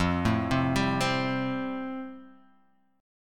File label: F Major Add 9th; arpeggiated; x,x,3,2,0,2,2,x; JGuitar